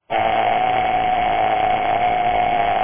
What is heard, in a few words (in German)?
poljot, armbandwecker